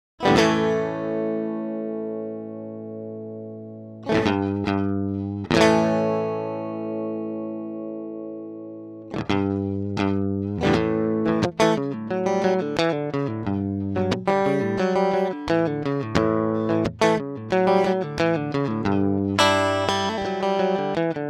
gain dry; guitar

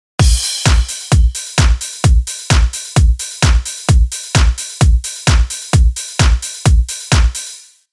Drum

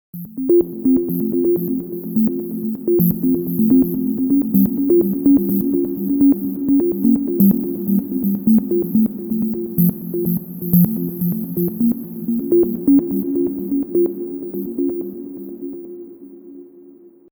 Arpeggio

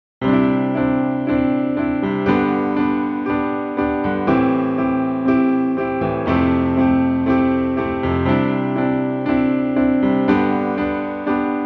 piano

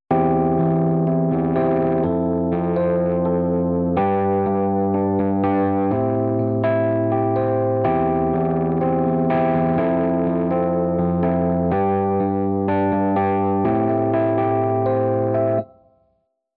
EP wet

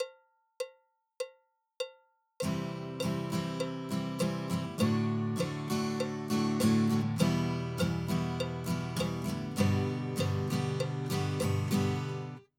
chord stroke; guitar

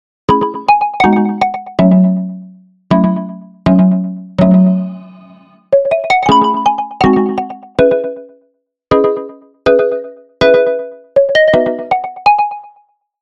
Pluck

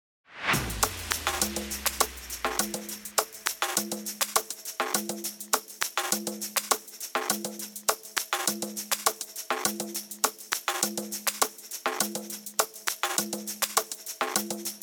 Apple loops; guitar

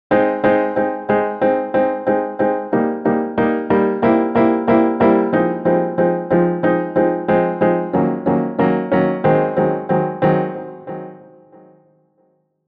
Piano

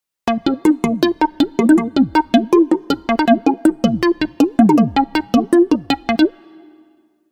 Pluck

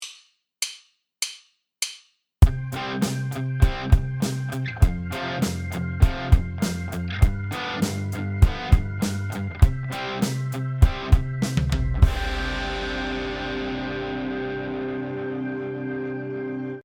bass

